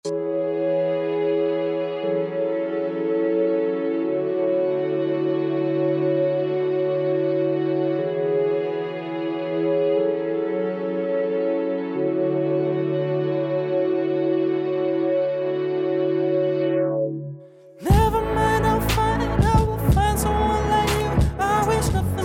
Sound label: Beat; eerste demo